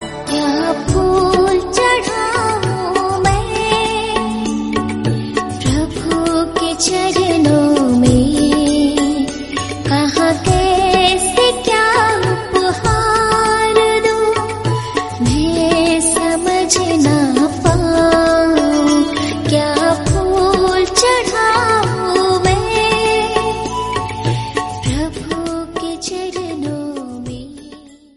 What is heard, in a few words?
devotional bhakti hindi